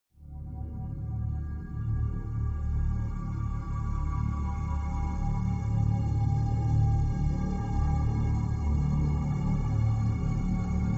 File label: bgm